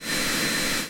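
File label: buzzing of a metalworking machine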